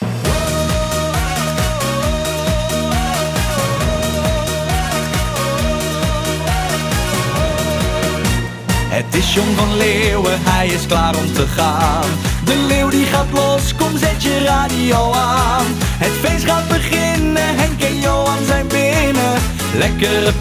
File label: Jingle; Gino Graus; John Van Leeuwen